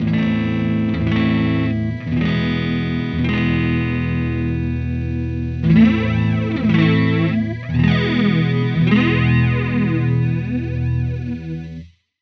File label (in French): effet flanger